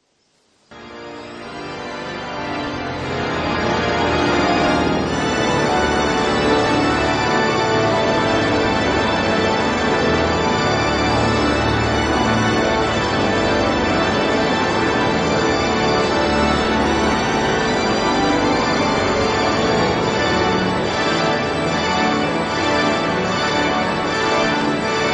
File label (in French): carillon de westminster